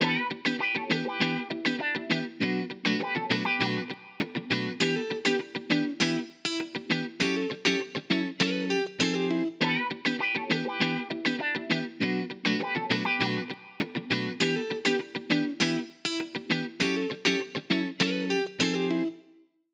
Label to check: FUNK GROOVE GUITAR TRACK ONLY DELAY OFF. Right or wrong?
Right